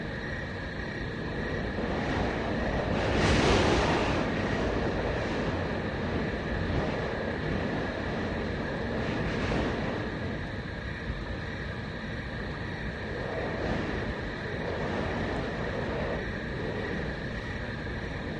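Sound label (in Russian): ночь сверчки и сильные порывы ветра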